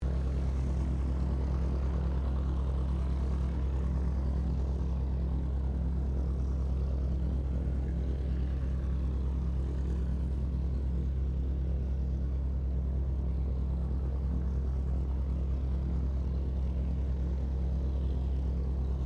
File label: AIRCRAFT HAWKER SEAFURY IDLE DISTANT, fighter-bomber; Airplanes; Vehicles